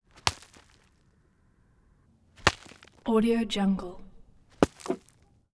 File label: mud burst hardened clumps dropping to dirt; prosoundfx